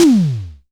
Disco Tom - Long; Tama TS-206

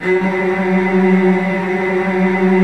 F#2, Strings, STR HLF TRLL; ILIO; Kirk Hunter Virtuoso Strings VOL-3; 90 s Sample CDs

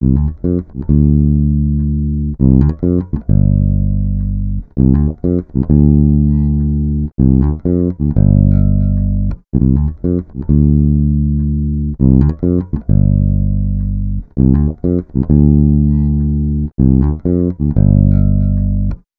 Bass, Electro Lounge; Sonic Foundry (Sony Creative Software); 100 Rate Plan

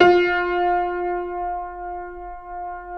Keyboards Acoustic, F3; USB Soundscan vol.17; AKAI

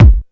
Misc Kik, Drum Machines, E-MU MoPhatt; 300 Drum Machines

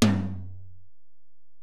Tom, Alesis DM-PRO

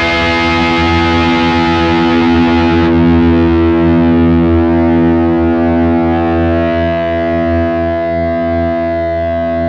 FEEDBACK, Funk Guitar; 90 s Sample CDs; Zero G

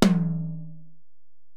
Tom, Alesis DM-PRO, Drum Machines, Toms

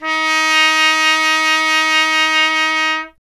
trumpet, D#0; Miroslav Vitous - Solo Instruments VOL-1

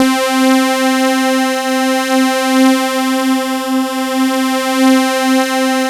OH BEE, KURZWEIL; BASIC-KW2000

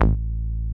DR. LOOP, BASS; X-Static Goldmine 3

